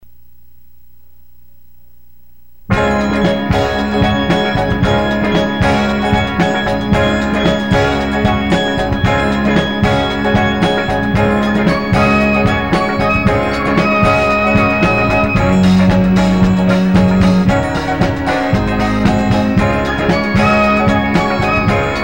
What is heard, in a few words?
(Instrumental)